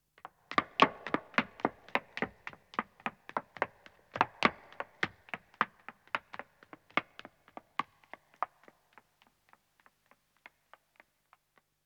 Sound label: Stone Running, 1 Woman Departs, Footsteps; BBC Sound Effects Library; Footsteps II